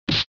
SNES Fighting Hit

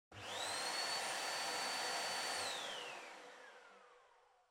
Vacuum Cleaner Far Vacuum; 掃除機02-19(遠い バキューム)

